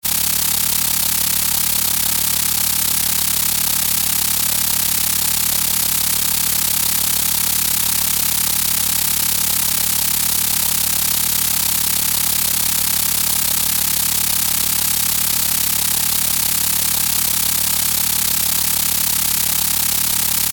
science fiction radio signal lost; sound effects one

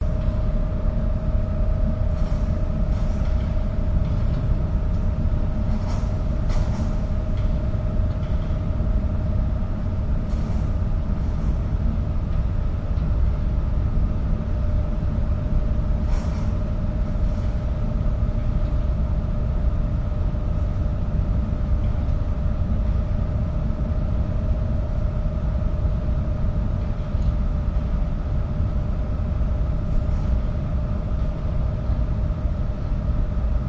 ferry before departure on board vehicles entering; your free sounds

